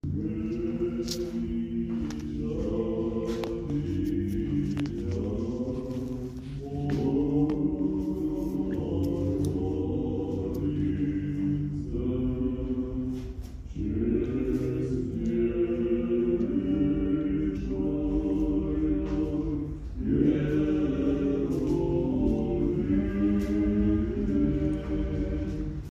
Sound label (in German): Pilgergesang Panagia